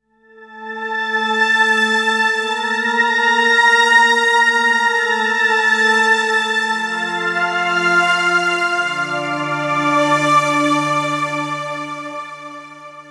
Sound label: mystical sms